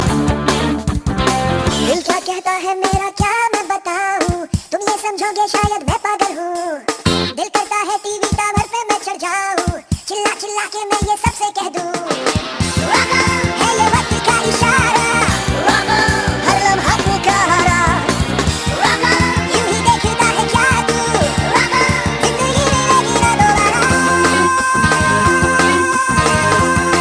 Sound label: Baby Rap Rock